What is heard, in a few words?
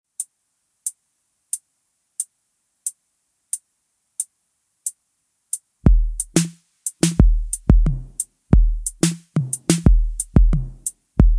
Drum Machine Track